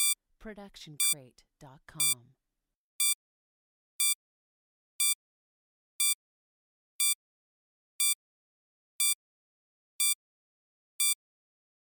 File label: Bomb Timer Beeps